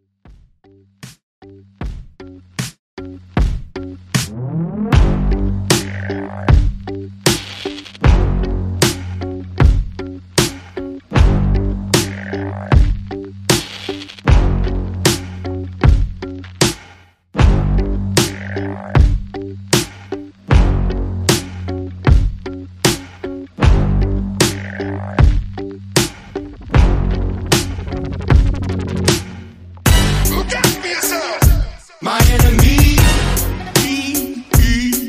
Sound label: Top40 Redrum 77 Bpm